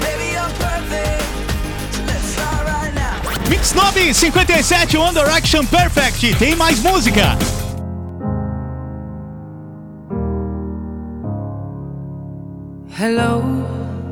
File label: Hello portuguese